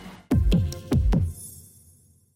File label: audi sport ringtone